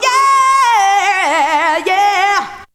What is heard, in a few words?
VOC